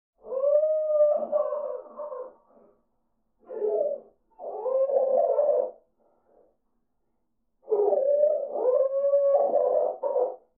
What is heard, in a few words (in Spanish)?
fondo bebe